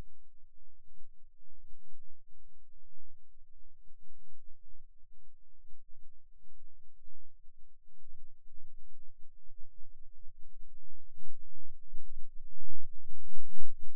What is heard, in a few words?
Rhythm Seizure, eeg